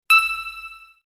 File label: ding